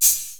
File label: 808 CHH, 480L; Wizoo; Platinum 24 Electronic; 480L GA 808